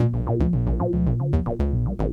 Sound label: bass; recreational research foundation